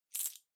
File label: Public Phone Coin Noise